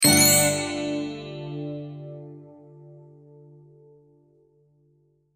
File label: Tribal Hit Eastern, Tribal Hit Eastern Wind Chime Guitar Chord; Tribal Hit Eastern Violins Wind Chime Guitar Chord; Musical Sound Design; Blastwave